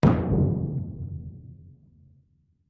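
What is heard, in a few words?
2 Oz Black Powder Bomb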